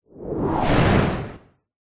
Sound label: cinematic whoosh fast close doppler; sound design